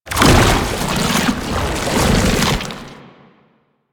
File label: Turn Full, Foley; Enemies; Warframe; Proto Infested; Babau